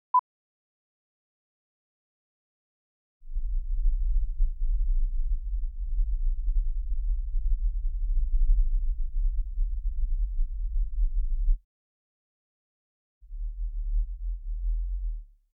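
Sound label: 25hz, 31.5hz, 40hz, -20/-16db L/R [L 1/3 octave Band Noise, R 1/1 octave Band Noise]; Denon Audio Technical CD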